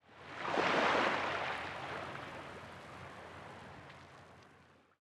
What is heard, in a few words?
wave